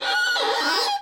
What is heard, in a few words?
Violin Screech Noise Mid; soundscrate